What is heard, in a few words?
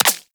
Bullet Impact Flesh; Warframe; Bullet Impacts